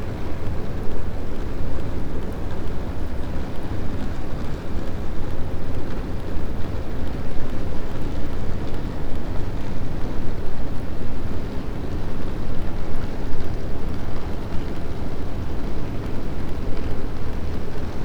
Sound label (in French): cachalot positif, Physeter Macrocephalus